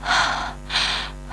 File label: Girl Breathe